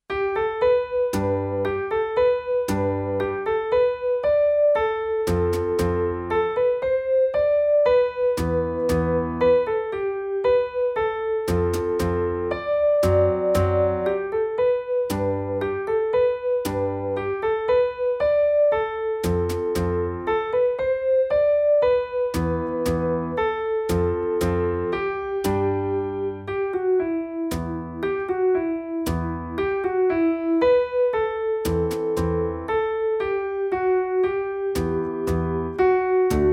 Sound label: Modal Waltz